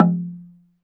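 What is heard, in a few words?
SLITDRM F3 F, slit drum; SLIT DRM1; Heart of Africa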